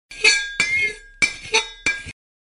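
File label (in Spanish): tenedor vaso; tenedor baso; sonidos insoportables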